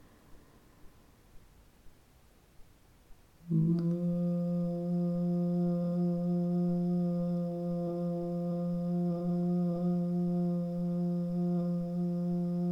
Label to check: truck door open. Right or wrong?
Wrong